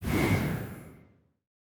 dual swords off

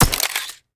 cam click

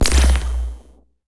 uavlaser

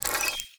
ui map open

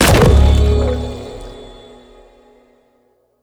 ancient sword

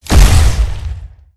full damage

